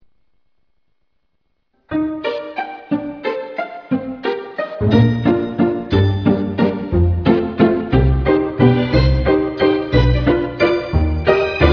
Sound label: Minuet